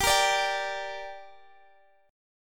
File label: G Minor, Guitar DADGAD; chordshape; down